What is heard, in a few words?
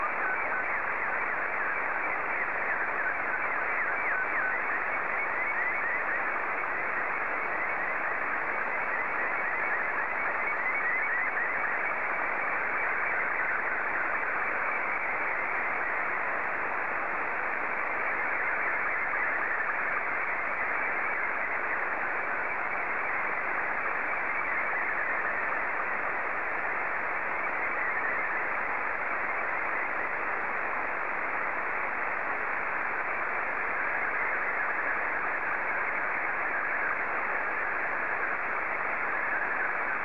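SSTV, Scottie 2; JA6GXP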